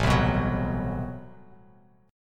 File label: A Minor 6th Add 9th A Bass Standard, Chord; Am6add9 strummed; JGuitar